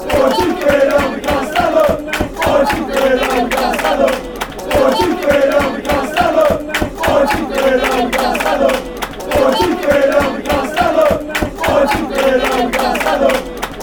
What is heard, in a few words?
chant; blackburn